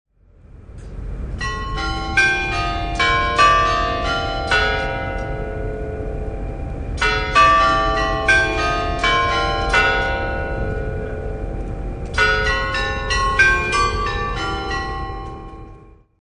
fortress chimes

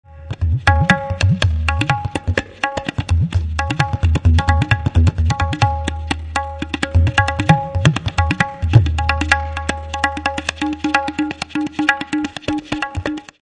tintal, world drums

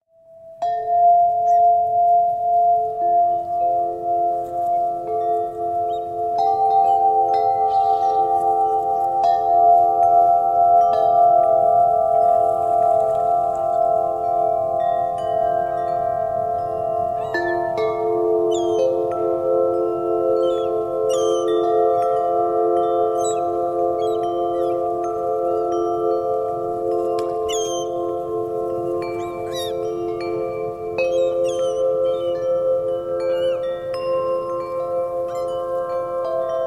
Gentle Seaside, windchime

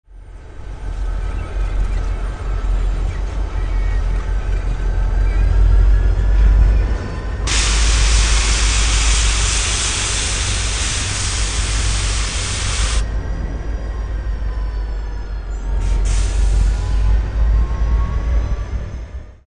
freight, world trains

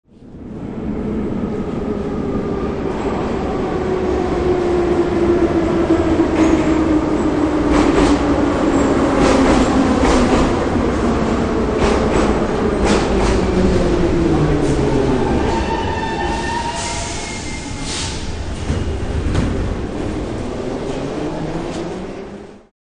metro, russian underground

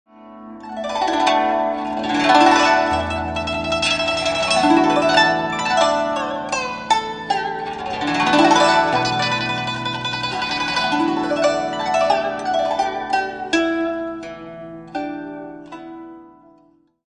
Ching

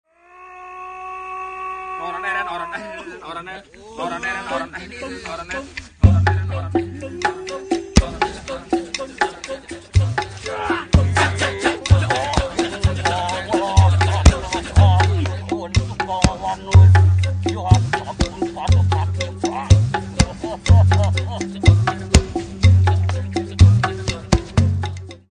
kecak

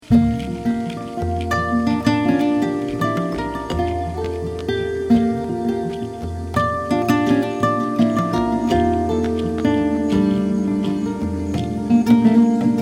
jungle guitars

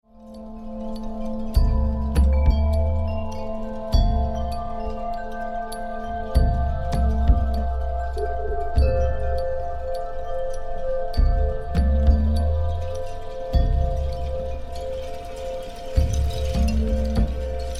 Tranquility, windchime

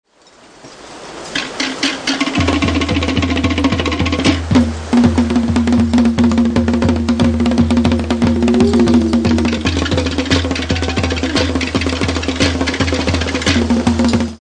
yatai, world drums

